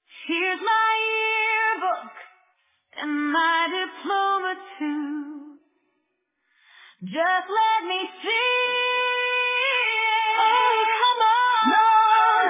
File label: only voice vocal